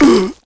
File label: Mummy Take Hit; Playable Tutorial Level